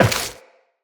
Step Metal, Footsteps, Walk; Characters; Tenno

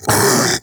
Infested Splitter Split Vocal Pain; Warframe; Grey Strain

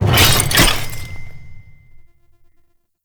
UI equip; Veilbreaker; Kahl Customization

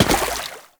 Step Water, Foley; Warframe; Oraxia

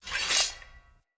Short Sword Equip; Enemy; Weapons; Short Sword Elite

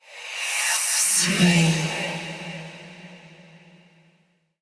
Hand Compass Vocal; Warframe; Duviri

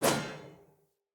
Sphere Polearm Whoosh B, Weapons Player

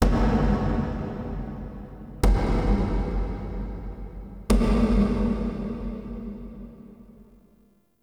Knocks Large; Warframe; Ambience; Entrati

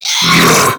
Infested Diseased Ancient Pain

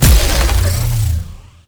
Infested Lich Parasitic Link Cast; Warframe; Abilities; Zeke

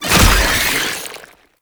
Passive Tentacle Spawn; Warframe; Abilities

